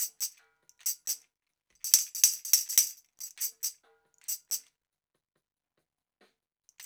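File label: TAMB, tambourine; Drumdrops In Dub VOL-1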